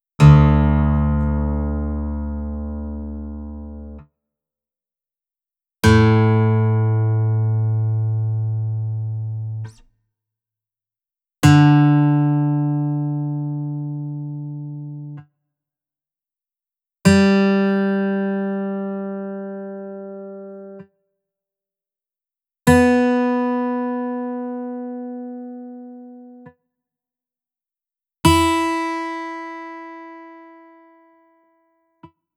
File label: Drop D, guitar